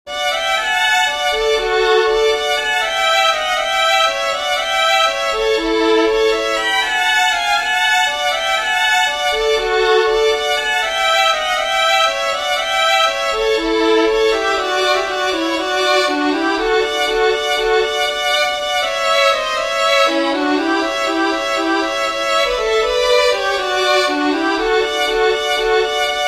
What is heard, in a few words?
SILVER AND GOLD TWO STEP with harmony, fiddlers; southern kings fiddlers